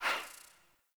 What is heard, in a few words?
UI Theme Error; Zephyr